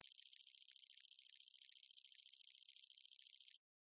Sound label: ui computer simple text long